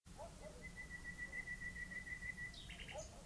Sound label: natural bird sound